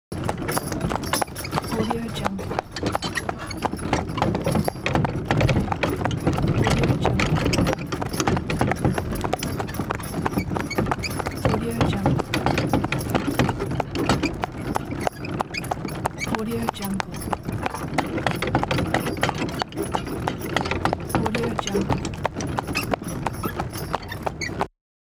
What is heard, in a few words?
horse carriage